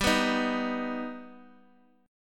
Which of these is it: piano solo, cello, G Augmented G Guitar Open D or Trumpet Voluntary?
G Augmented G Guitar Open D